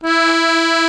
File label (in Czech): AKORDEON E 2; Best Service; Ethnic Journey Sound Cube